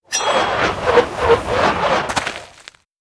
Special Saber; LooterKings

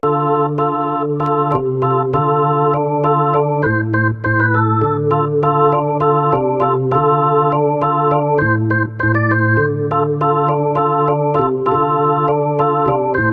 Piano Cat; Rock Island; My Musical Cats; Android, iOS

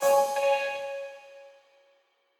Quasar Clear B

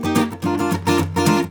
Guitar Riff; the princess and the frog; Bayou; Music Riverboat

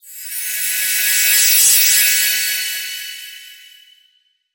UI magic reward; Armello The Bandit Clan

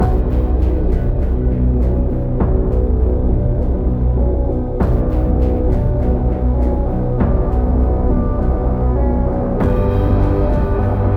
Darkest Loop; Face It - A Game to Fight Inner Demons

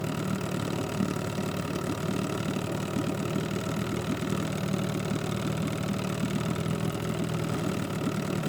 vehicle woodboat idle

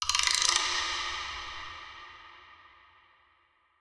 Percus

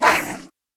Gnaw; ChooChoo Heroes

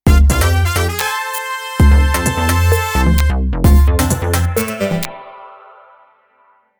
GAME ROUND WIN

